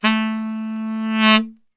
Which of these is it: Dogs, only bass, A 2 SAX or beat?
A 2 SAX